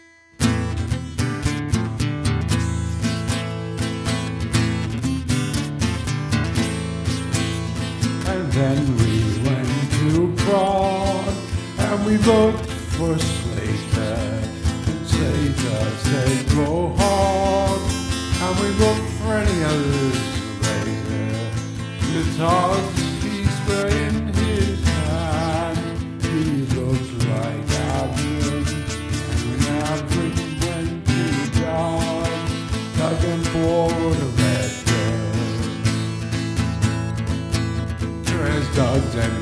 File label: Jam